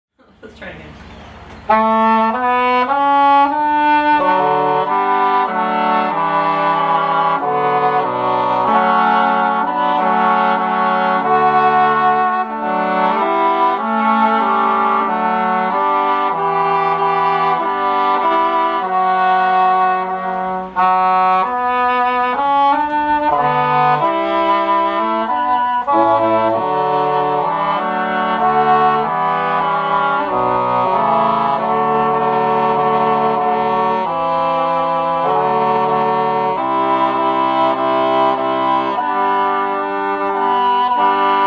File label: Heckelphone